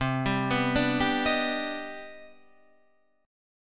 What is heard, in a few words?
C Minor Major 9th C